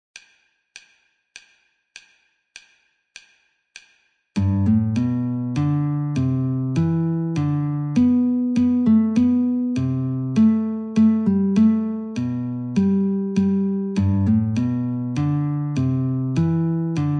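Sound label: polka Guitar metronome 50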